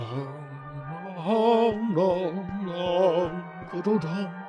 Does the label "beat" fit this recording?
No